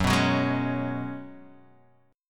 F sharp Suspended 2nd Suspended 4th, Guitar Half Step Down